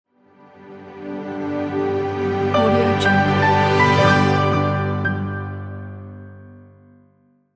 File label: welcome logo